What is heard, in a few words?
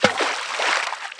Splash